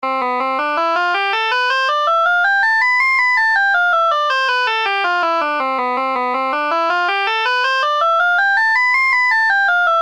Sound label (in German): C-dur scale